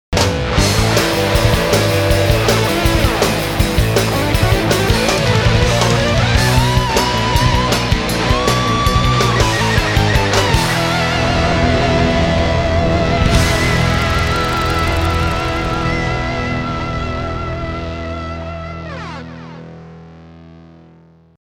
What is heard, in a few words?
Rock